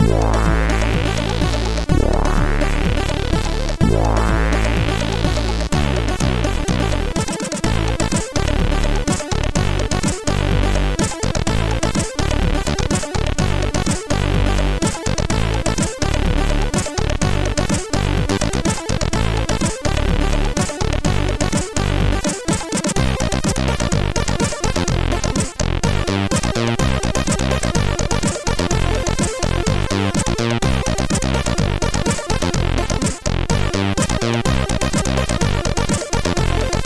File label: CSG8580